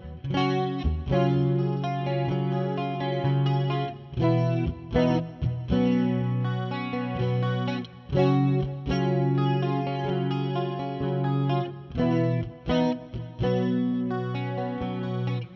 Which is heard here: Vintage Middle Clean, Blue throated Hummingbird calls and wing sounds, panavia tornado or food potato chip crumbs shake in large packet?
Vintage Middle Clean